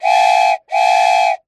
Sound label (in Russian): gudok igrushechnogo poezda